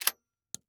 Weapon Bullet Shell 12 Gauge Eject Shells On Dirt; AdobeCC

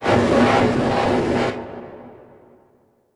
Transition Blast Pulse; Production Element Title Transition

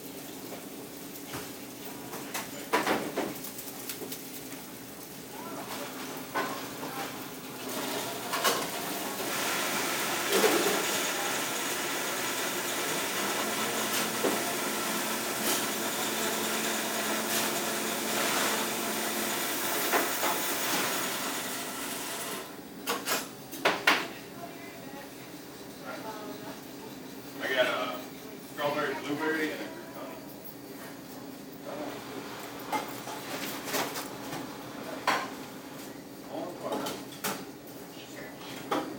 Coffee Shop Ambience; Blackmagic Fairlight

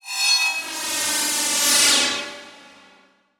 Transition Whoosh Long; Production Element

